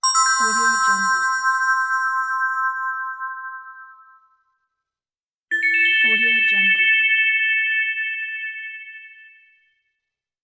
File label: bonus point